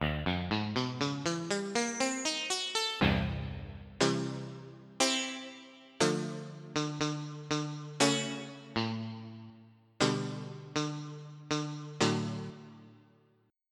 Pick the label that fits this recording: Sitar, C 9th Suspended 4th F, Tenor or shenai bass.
Sitar